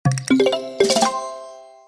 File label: victory